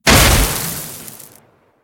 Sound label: impact ground lite